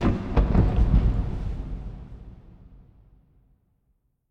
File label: Fall Down; LOOPERS